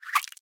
SFX Int Windows Appear; mighty party 2017 gamerip pc ios android

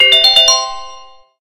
Itemget; Mercenaries Saga 2; Android

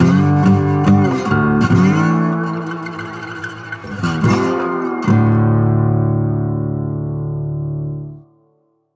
complete sting

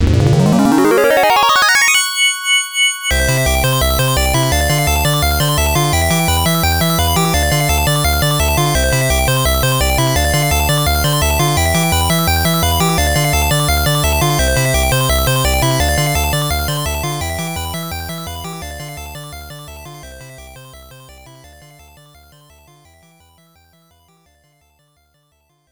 Bonus Music; Bubble Bobble; FM Towns

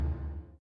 Mus Tsunami 145 Light; from dust

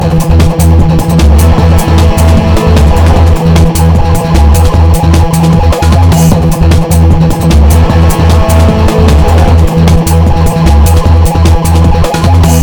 Hardcore; WWE Raw 2; Xbox

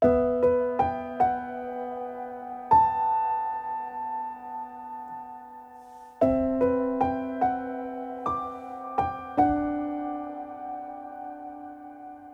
BGM Occasion

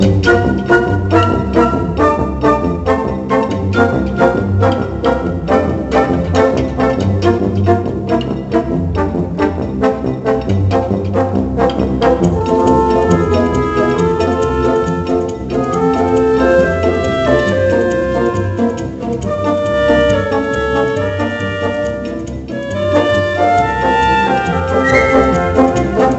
Ska

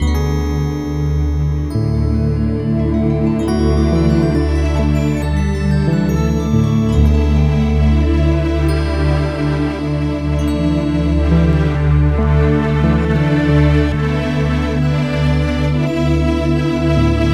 atmosphere; Shrek Ogres & Dronkeys; NDS

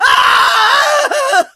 Scream Male; Friday the 13th: Killer Puzzle

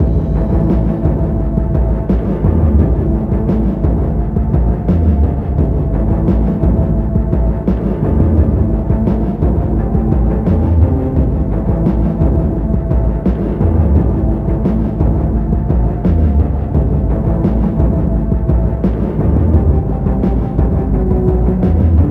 Radio Inside Far; Crackhouse Radio 3

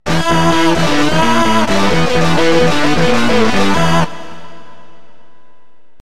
Guitar Gazump; donkey kong 64; Diddy's Guitar Gazump

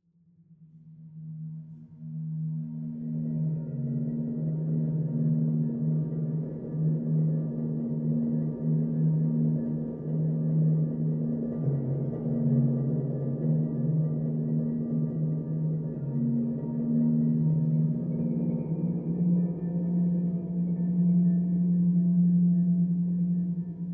String Bends and Broken Harp Mood; gamerip; the last of us

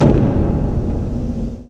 sfx Battle Begin; lego ninjago rise of the snakes

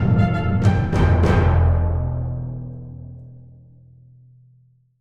Tension; Racing the Wind 02 - Tension B Short

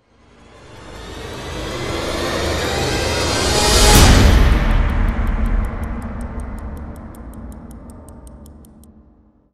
QUICK SWELL HIT; transformers war for cybertron gamerip

